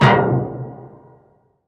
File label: Stab Vinyl Horn